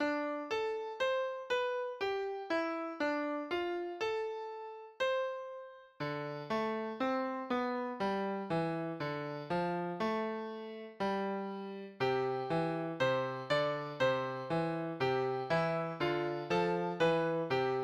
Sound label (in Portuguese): música de espera